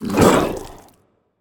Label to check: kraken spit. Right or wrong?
Right